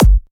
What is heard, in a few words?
Dr Kick